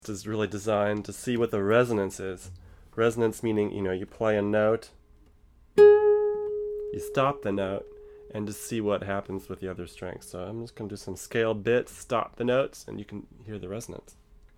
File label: Resonance; Ramirez 1970F